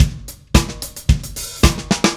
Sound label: Drums Fill, Indie Rock; Sonic Foundry (Sony Creative Software); Indie Rock Anthems; Session 01 - Brighton